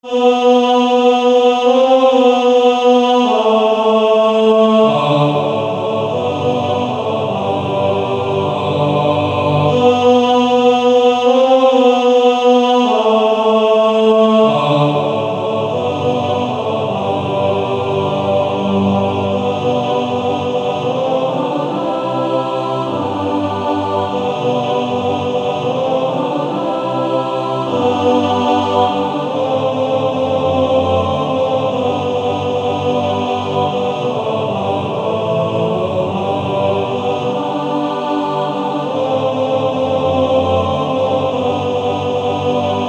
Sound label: Male Choir